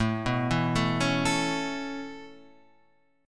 E 6th Suspended 4th, A; 6sus4:1,4,(5),6; E6sus4/A Chord {5 2 2 2 2 5} arpeggiated

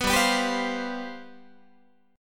G 11th, Guitar; strummed

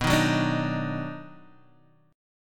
Eb Minor Major 7th Sharp 5th B

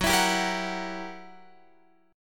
F sharp 7th Flat 9th Guitar Open F, chord; F#7b9 strummed

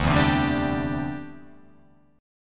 D 7th Suspended 2nd Suspended 4th D

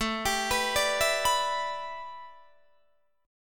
A 9th Suspended 4th A, Guitar DADGAD

